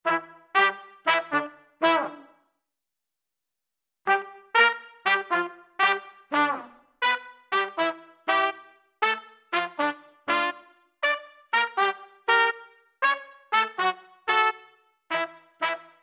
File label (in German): phrasen rnb